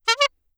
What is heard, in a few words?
classic horn, bicycle horn, Anechoic; OPENAIR